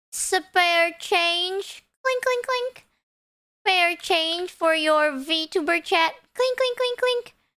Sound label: cheer